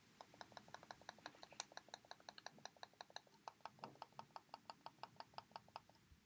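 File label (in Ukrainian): Logitech M590 лівий клік правий клік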